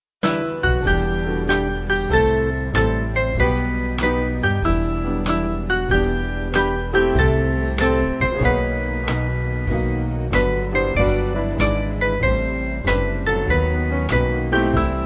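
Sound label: Band, Music Backing Files for Churches; 5/Em-Fm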